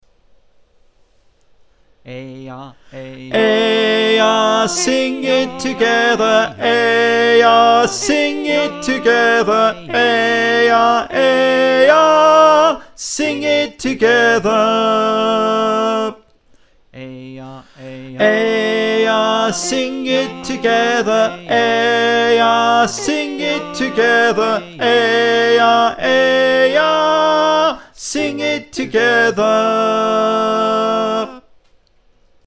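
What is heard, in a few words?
tenor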